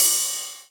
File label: Ride; osu